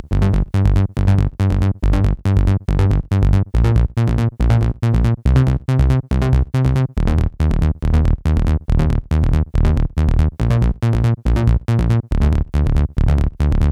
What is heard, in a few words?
Bassline DRY 140BPM, G minor; Vengeance Samples; VTS1 Devastating Truth Kit